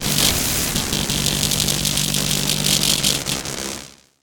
magic spells cast long electricity; sonniss gdc

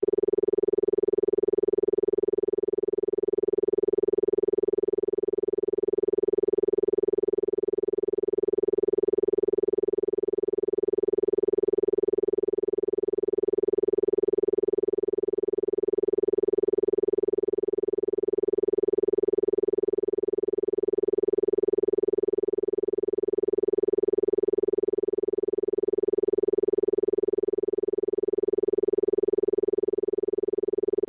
400hz isochronic tone; sonniss gdc

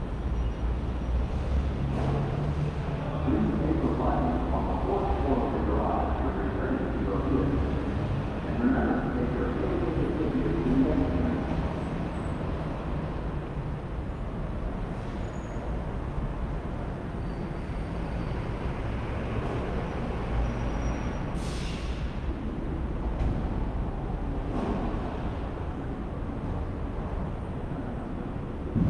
airport parking garage ambience loop; sonniss gdc

